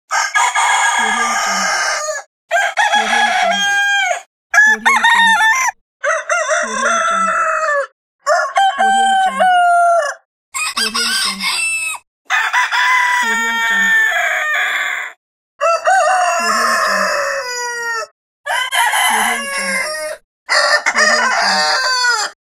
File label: rooster crowing pack